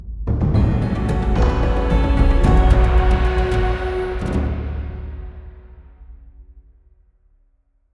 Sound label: quest complete; Please the Gods